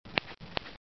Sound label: Scissor Snips